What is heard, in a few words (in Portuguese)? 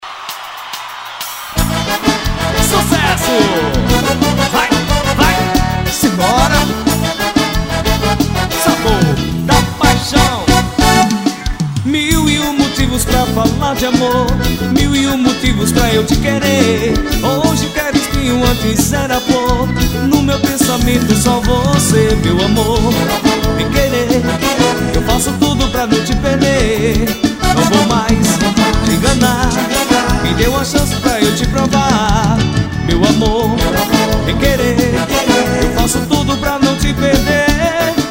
forro ao vivo; a delicia do forro